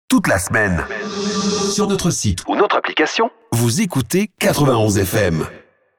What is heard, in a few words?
Drop Jingle